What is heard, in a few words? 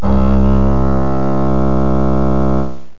cello, strings; Sounds Terrific II (1996); Weird Science